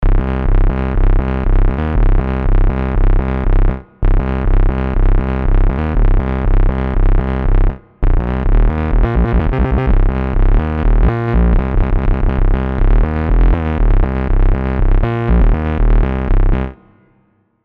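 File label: alt octaves, bass, 120; Oxium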